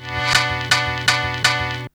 PIA FX, Hip Hop R n B; USB Soundscan vol.19; AKAI

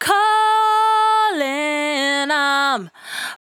68bpm D, Velvet Vocal; Zero-G

